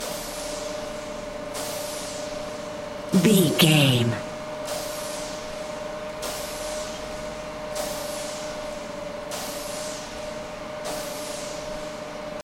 background construction factory warehouse machine loop